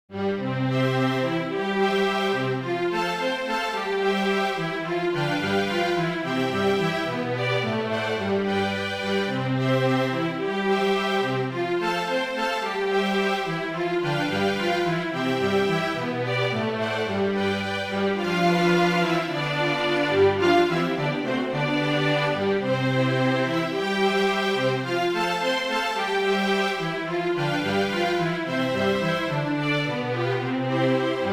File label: Schumann Happy Farmer ensemble